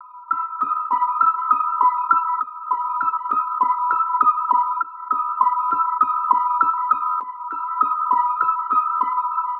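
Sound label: 100 Emaj Seq Synth Psycho Clock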